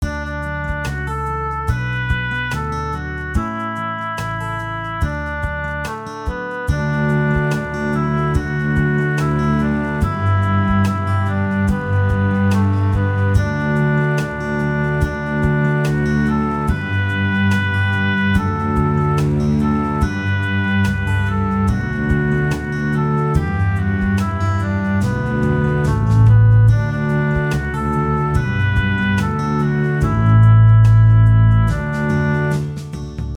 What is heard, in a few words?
bass